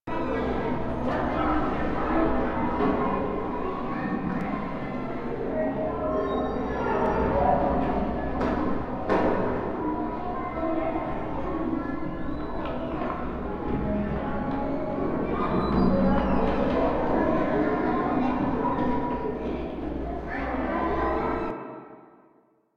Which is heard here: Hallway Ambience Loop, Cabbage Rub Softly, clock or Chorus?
Hallway Ambience Loop